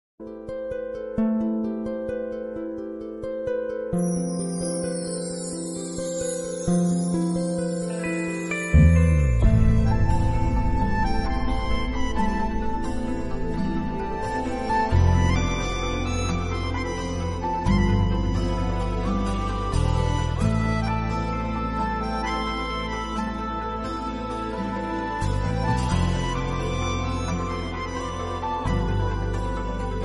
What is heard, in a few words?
instrumental; nagin 3; nagin bgm